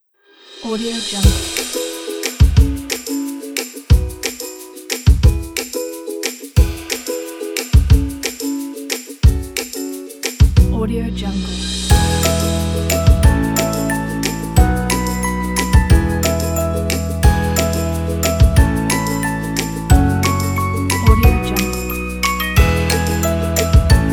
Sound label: happy kids party